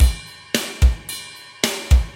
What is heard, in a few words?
metal